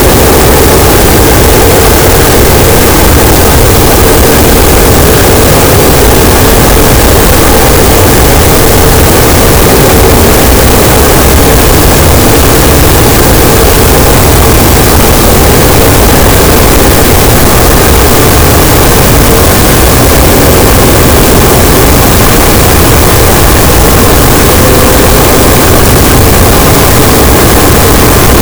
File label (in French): cachalot negatif, gaussian noise